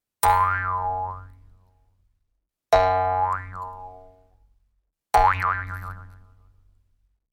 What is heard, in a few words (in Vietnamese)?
Tieng nhay lo xo nen doi lai hoat hinh